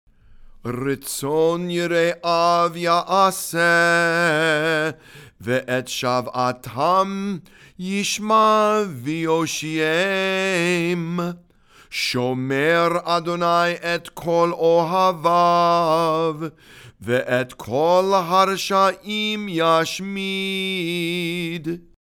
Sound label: ashrei read and sung